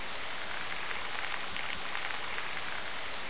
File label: rope; svencoop; items